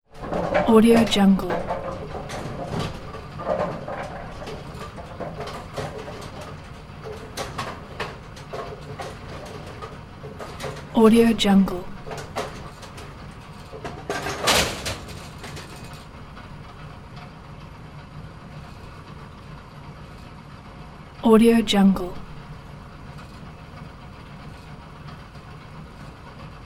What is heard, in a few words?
bowling pin set